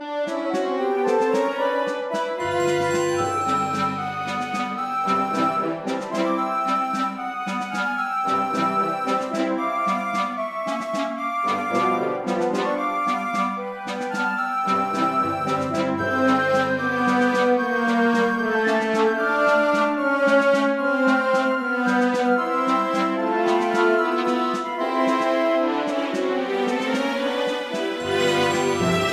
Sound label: Underwater; super mario maker 2; SMB1 Underwater - Edit